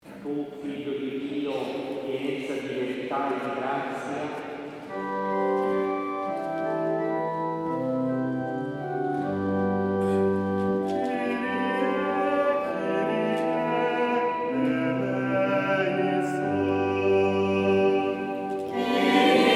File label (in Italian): Kyrie Eleison, Coro; Chiusura 50esimo